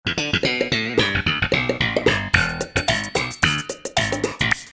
funny bass

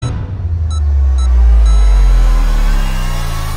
bass drop

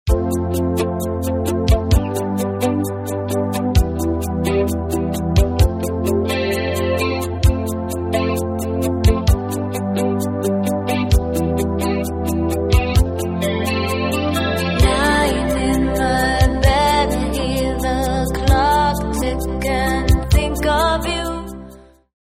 lofi

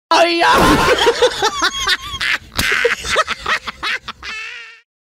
laugh track

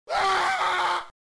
fire scream; metal slug

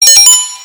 loud notification; don pollo

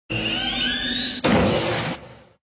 door close; conan

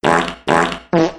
tv song; frasier

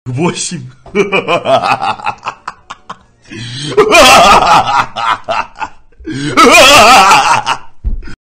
laugh; melstroy

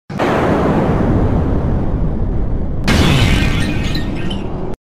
swoon sound effect

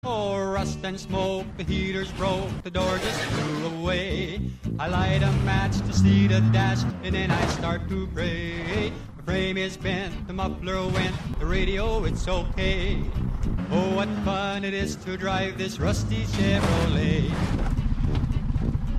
christmas song

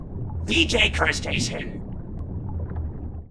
bubbles

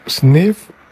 sniff

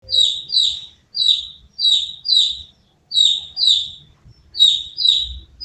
chicken chirp chirp

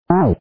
spitting; yoshi spit

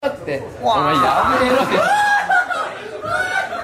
water

